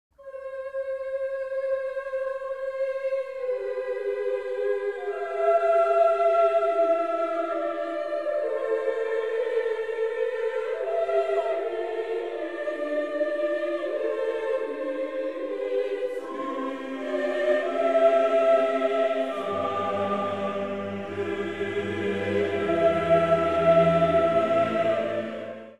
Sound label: Kyrie Solemn Chorus